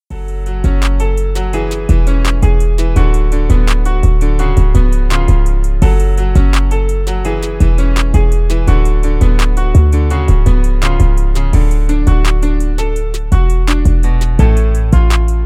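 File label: instrumental; хип-хоп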